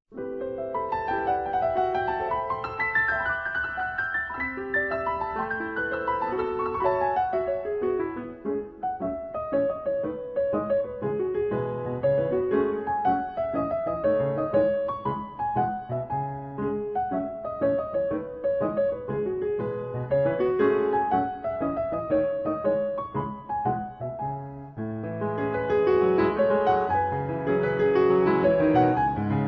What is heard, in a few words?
piano; finalfantasy10; piano collections